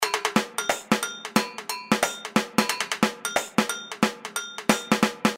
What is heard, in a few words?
Batidao Breakdown, samba